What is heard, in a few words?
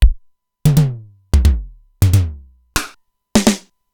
Simmons SDS-9